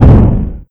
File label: explosion medium; endless sky